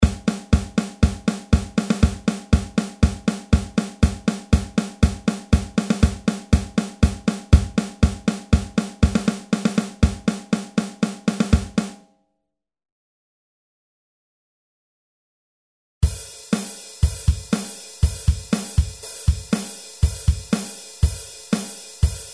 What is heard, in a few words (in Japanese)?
ドラム